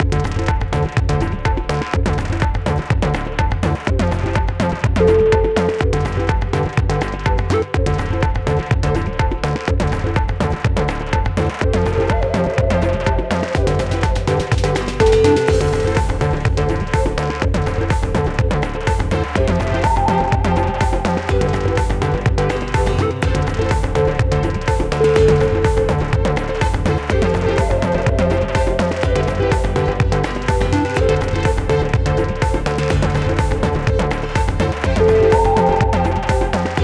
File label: tribal dance